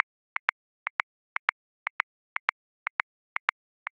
Jazz Swing Tight Cool 8ths; Grooves; Ableton Live 12 Lite; Core Library

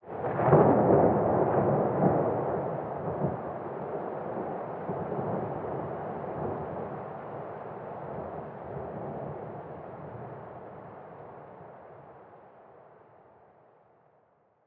thunder

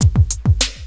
open; Quicken

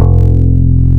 Instrument Nylon Guitar; Ableton Live 12 Lite; Vector; Sprites

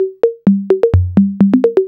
Congas 128 bpm; Loops; Drums; Percussion; Ableton Live 12 Lite; Core Library

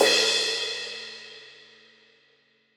Crash, Cymbal; One Shots; Drums; Ableton Live 12 Lite; Core Library

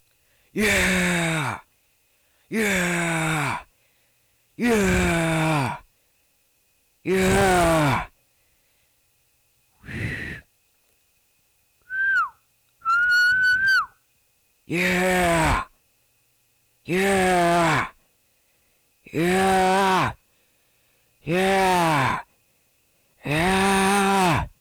crowd

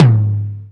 Tom DMX; One Shots; Drums

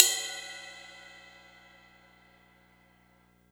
Ride Clean; Drums

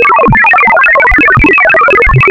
Harmonics Sines Bunch; Ableton Live 12 Lite; Vector; Sprites

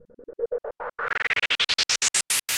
Riser Noise Gated; One Shots; FX; Ableton Live 12 Lite; Core Library

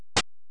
dwarf hammer; Open Zelda 6.2.4; Quest Designer 2.0.4

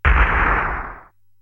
pound; mmx sfx

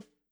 Snare Stick Hit Soft Dry Session, drums

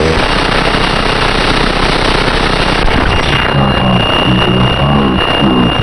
Noise Radio; Ableton Live 12 Lite; Vector; Sprites

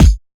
Kick Tamb Combo

